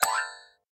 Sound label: Wood Money Hit; Farm Mania